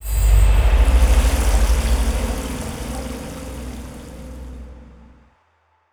environment temple room water; Aragami

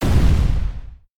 Wizard Firestorm Impact; Idle Champions of the Forgotten Realms

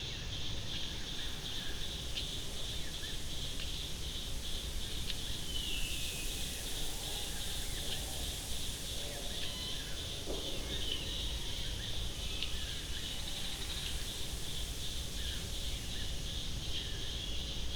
Ambience jungle; adams venture origins

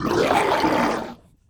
Intestines Scream; Slime-san 2017 pc gamerip

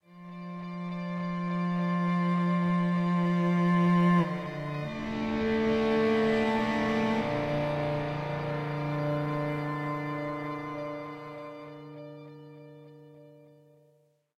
Stinger; Naan Light Candle

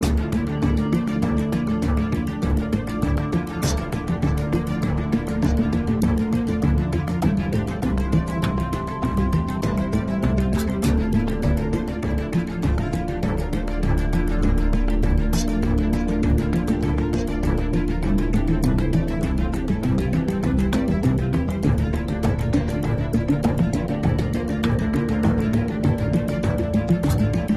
Action loop

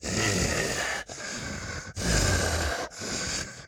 Walk Cycle Burns; Devour; The Town; devour 2021 gamerip pc macos

